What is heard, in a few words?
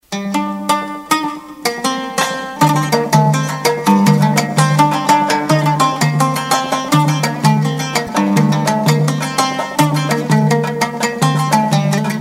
Balochi Violen